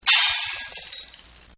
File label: Glass Break; Materials; Partners In Rhyme